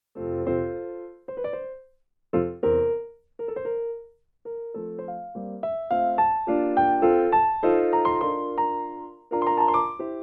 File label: pianist very unexpected